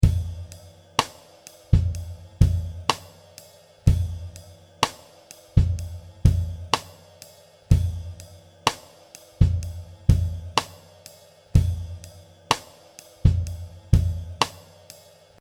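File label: 3 step Jazz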